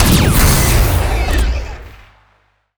weapon torpedo fire; weapons; starruler

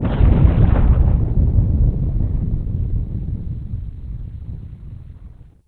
core implosion blast; starruler

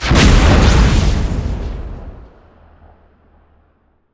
weapon torpedo fire; weapons

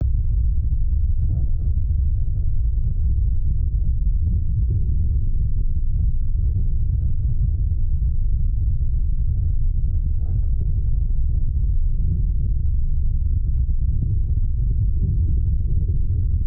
tear; starruler

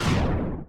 muon, weapons; starruler

